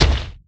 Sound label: large step